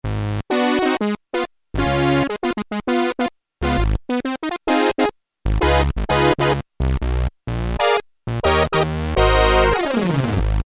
Clav, Korg Poly-61M

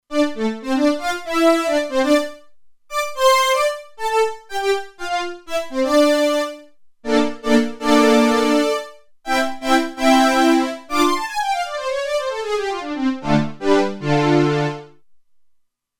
Fast String, Roland Alpha Juno-2; Original Sound Chart patches

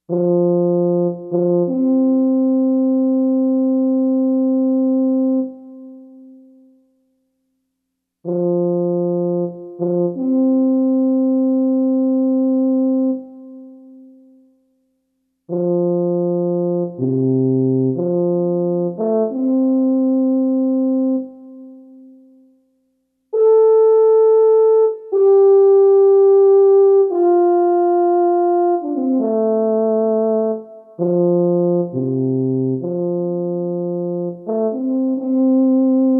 French Horn, Korg MSC-4S Orchestra; Orchestra 1

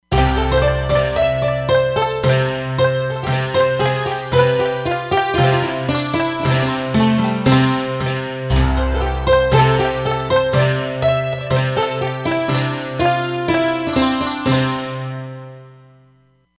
Santur Stack, Roland Fantom XR

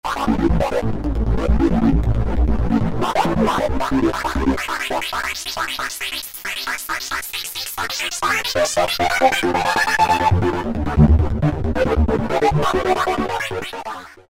Grimey Storm, Korg microKORG; Factory Voices

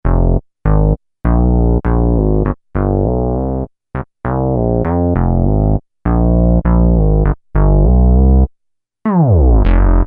HIPHOP-VINTAGE, Korg microKORG; Factory Demo Songs

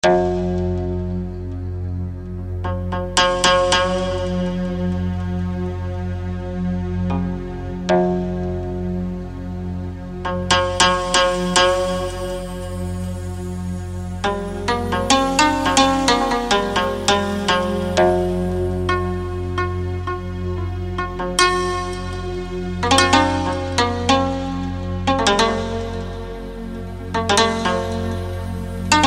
Shakoto, Korg TR-Rack Combi

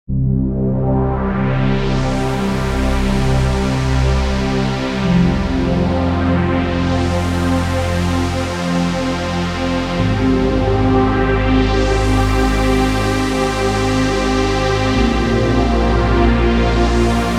Resopad, SuperWave P8

